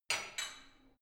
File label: barbell only lift up soft, fitnessstudio